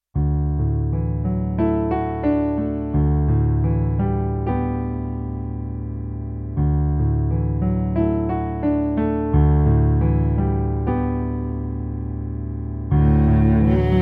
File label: cello